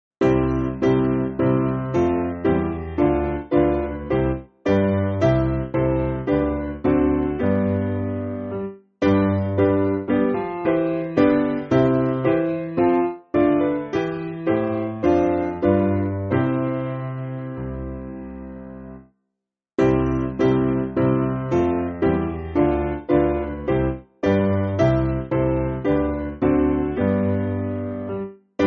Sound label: Piano, church music; St Leonard; Smart